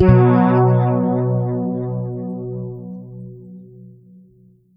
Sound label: MAJ DOBL B, PEDULLA BASS; Masterbits CLIMAX 7 - Bass